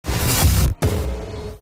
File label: erase